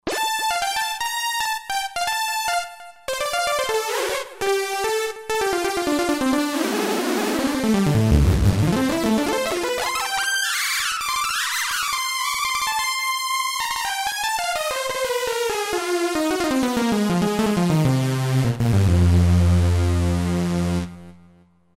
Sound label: Loud Syn Lead, Roland JV-2080